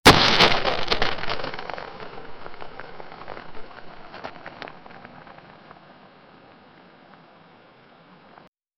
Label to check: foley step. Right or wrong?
Wrong